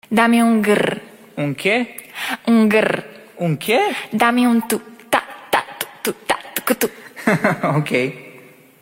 grrr; چالش اوم گررر ترند اینستاگ

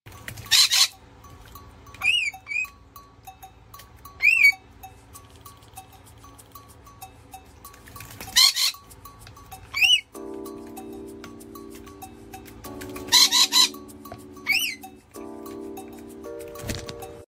Rainbow lorikeet

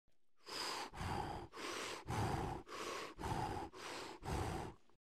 houf; هوف

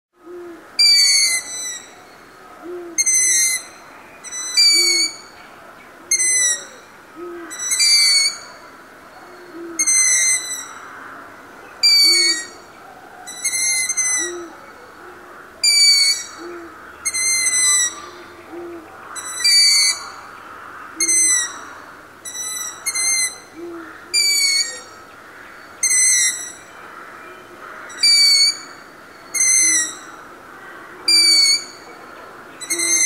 owl longeared sound

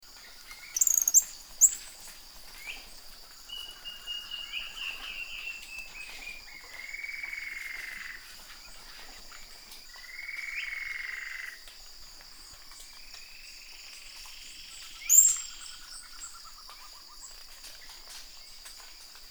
Orinoco-piculet, پرنده دارشکنک اورینوکو; صدای پرنده دارشکنک اورینوکو

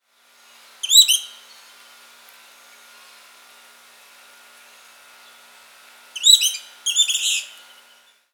Giant kingbird

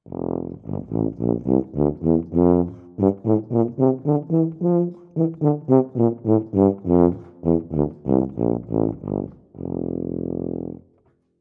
tuba; ساز توبا

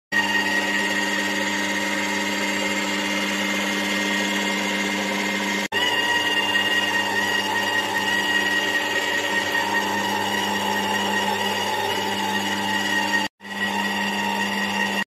Juicing; آبمیوه گیری

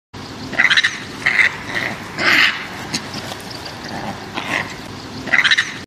Japanese macaque; ماکاک ژاپنی

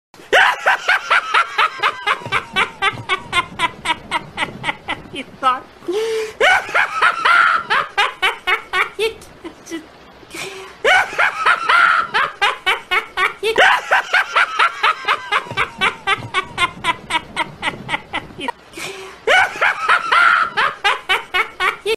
Scary doll laugh

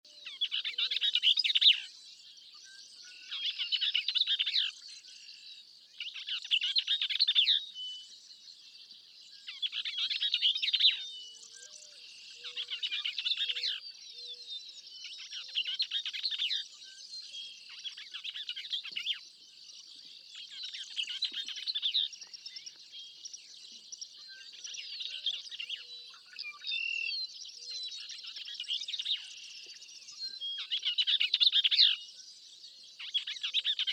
Bell's vireo

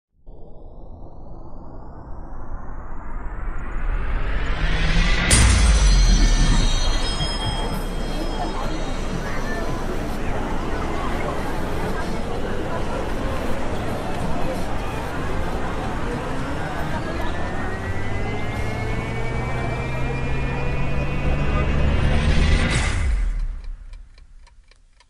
Mysterious space

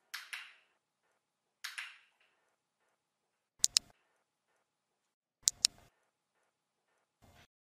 dog clicker; کلیکر سگ

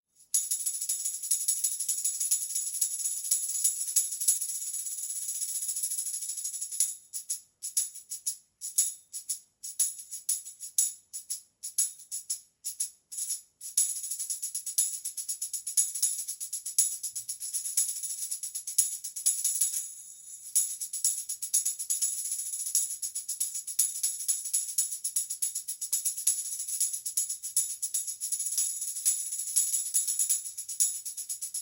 Tambourine

